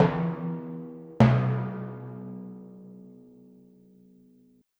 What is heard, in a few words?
Timpani, Orchestral; Sonic Foundry (Sony Creative Software); Orchestral VOL-2; Modern 02